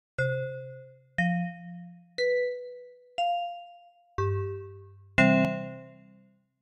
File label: glockenspiel; گلوکن اشپیل